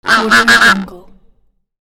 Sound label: australian wood duck